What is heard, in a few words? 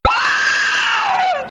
Scream